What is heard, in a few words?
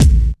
clean kickdrum sample a sharp key, Royality free kick drum single hit tuned to the A# note.; kick drum samples